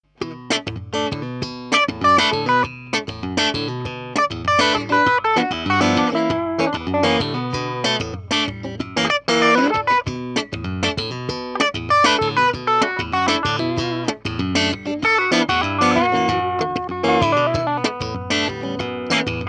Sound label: jam; Engl Gig Master 15